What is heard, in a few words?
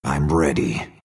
move pain; dota2; monkey king